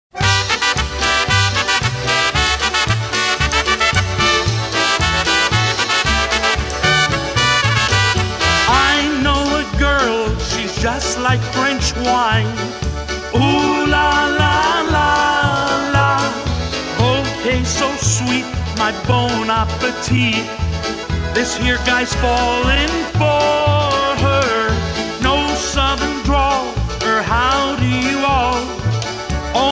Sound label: Polka